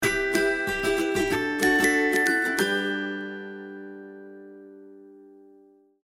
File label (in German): jingle kurzversion; charlotte